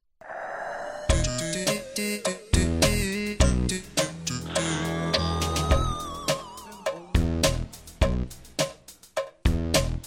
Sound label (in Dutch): spellied instrumentaal; speel met spel 1996-1997